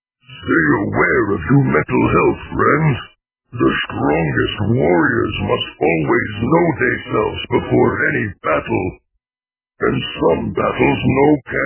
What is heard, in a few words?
thunk